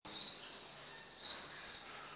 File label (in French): DESERT; MOBICLIC